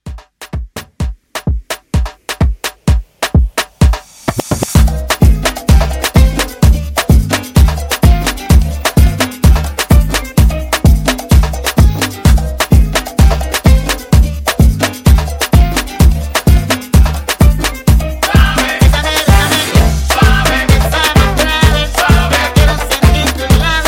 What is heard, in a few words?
Afrobeat Re Drum